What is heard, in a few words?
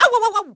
Owowowow; DS; NEWSMB